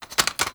Xbow Reload Grab Clip; Warframe; Tenno; Primary Xbow

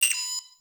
Rail Jack Tech One Off

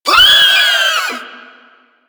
Proto Infested Volatile Runner Kamikaze Scream; Enemies; Abilities; Warframe

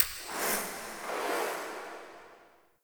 UI Encounter Stage

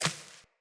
Sparrow Step Default, Footsteps; Animals

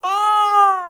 Kitten Meow Agitated; Warframe; Catbrow

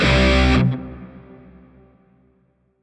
Shawzin Pent Maj Chord I; Warframe; Grineer Shawzin

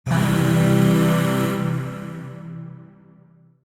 Void Shawzin Phrygian Chord; Warframe; Zariman; Chords

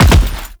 Land Heavy, Footsteps; Enemies; Warframe; Teshin

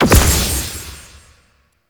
Corruption Pickup Large; Warframe; Missions; Zariman

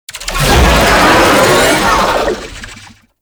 Infested Oni Vocal Idle; Warframe

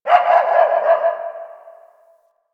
Distant Ambient Dog Bark

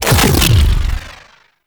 Proj Perfect Pulse Impact; Warframe; The New War; Weapons; Omicrus

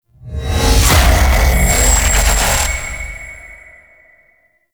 Mech Encounter Stinger; Warframe; Entrati Labs

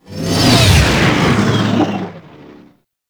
Fighter Ship Close Short Fly By; Warframe; RailJack; Corpus; Space Fighter Crp Ship Engine A

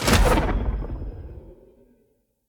Great Sword Range Rune Smite

